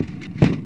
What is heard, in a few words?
OPEN TANK